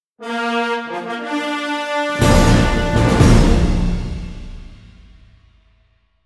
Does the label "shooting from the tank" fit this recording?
No